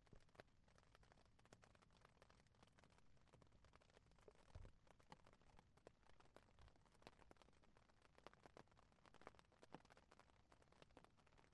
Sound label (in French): foret de pins parasols, grece; cyclodeambulations